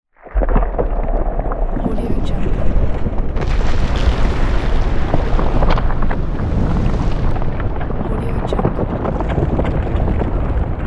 planet collapse explosion; فرو ریختن و فروپاشی; game

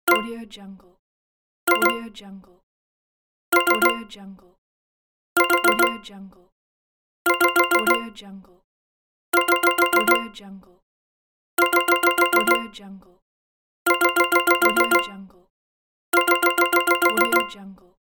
points alert pack; game

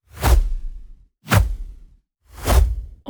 whooshes; transitions and movement

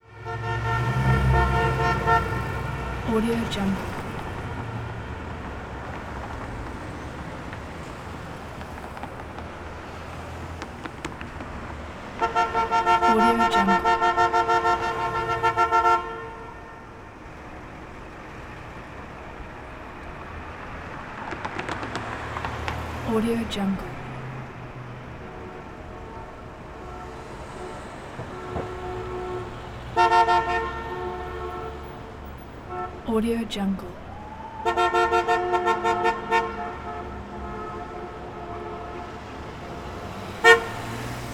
traffic horns traffic horns sparse by; urban sounds

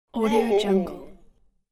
low male kill moan; ناله مرد بعد از چاقو; human sounds

